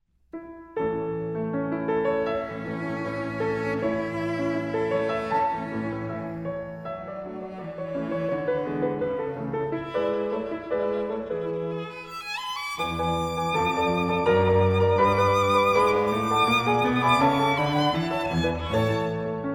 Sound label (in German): Klavier Trio